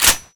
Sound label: lever action down; garrysmod